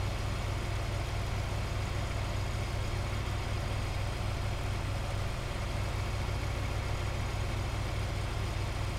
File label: vehicle stationwagon idle; teardown; ps5 windows xbox series xs